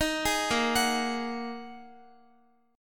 Bb 7th Suspended 4th Sharp 5th Bb Guitar 4E,4A,5D,5G,5C,6F; Bb7sus4#5 arpeggiated; x,x,13,13,10,13